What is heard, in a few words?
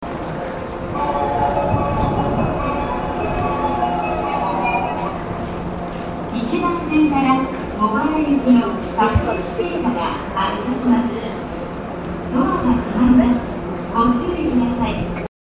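kamatori, departure bell; sotobo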